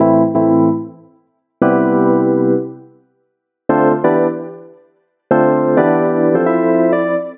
House UKG Piano, Am, 130bpm; house ukg keys

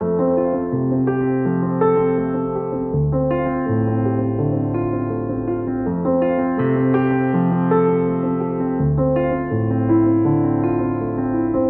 Classical Piano, F, 82bpm

